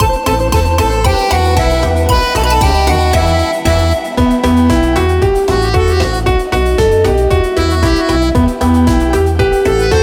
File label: karaoke